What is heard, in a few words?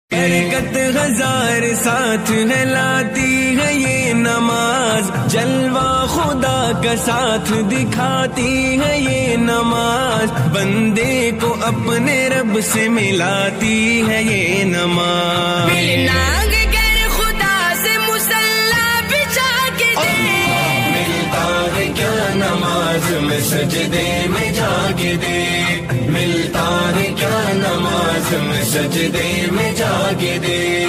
Islmaic